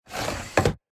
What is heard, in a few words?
wooden sliding door